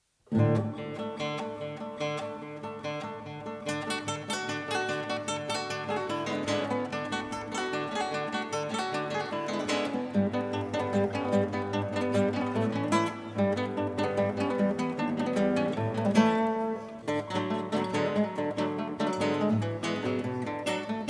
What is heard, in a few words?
Romanian Folk Dances